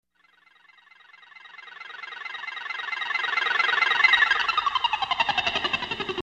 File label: stereobeep sample; djtyro